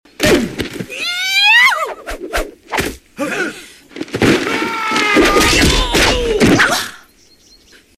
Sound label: Fight